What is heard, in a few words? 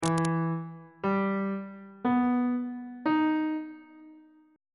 d double sharp minor major 7th chord intervals on bass clef